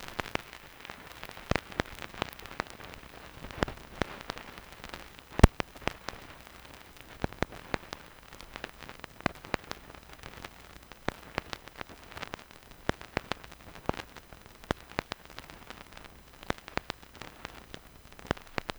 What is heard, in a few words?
vinyl crackle; 99Sounds; Vinyl Noise SFX